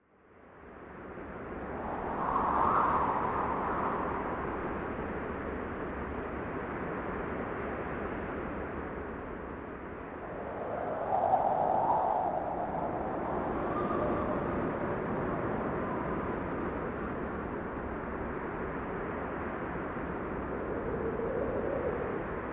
Wind Ambience; Kirby Mass Attack

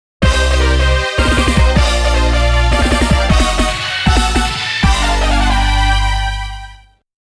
Game Start

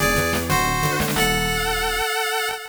Mono Stereo, nes

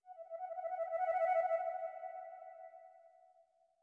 flute trill; Sky Children of the Light gamerip

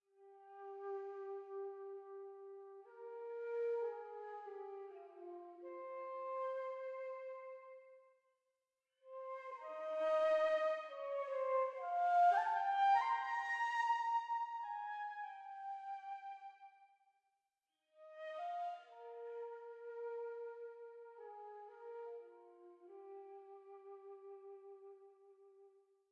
Night Flute; Sky Children of the Light gamerip

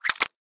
Card Place; Mighty Party; Android

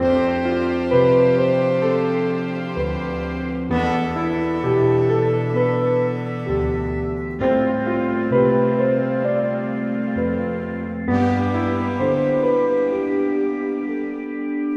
Indoor Music Loop; super crazy rhythm castle

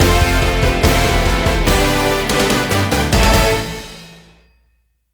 Win Event; angry birds transformers 2014 ios

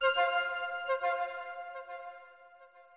Musician Rebloom Flute

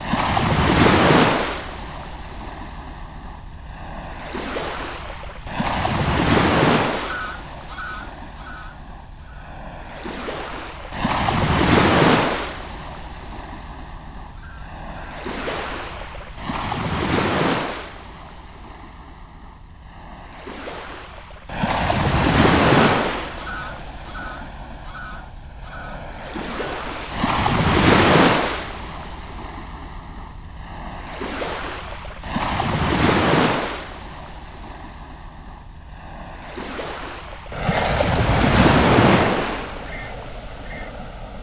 Ruins (Ambience); Baki

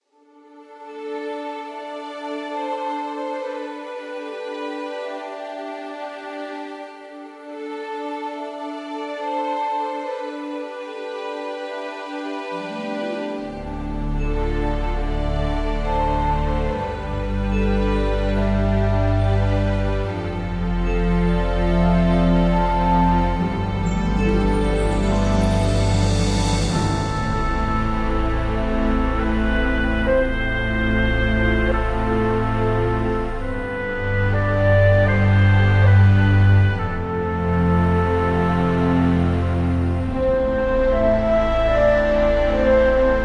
bgm map background; devils demons android game music

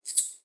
Coins

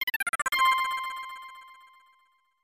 Elf Jingle; dragon knight 4; snes

